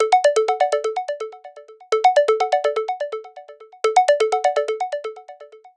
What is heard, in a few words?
Radio, ringtones; China Telecom